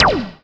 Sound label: BLIP, Anime Sound FX, SCIFI